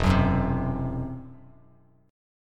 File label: E Suspended 2nd Sharp 5th E down Bass Standard; Esus2#5; strummed; JGuitar